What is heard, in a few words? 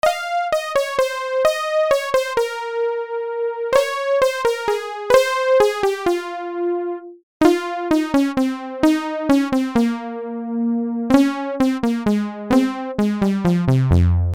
Mono Future Synth Funk Muffled